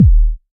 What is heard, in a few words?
kick drum sound d sharp key; kick drum samples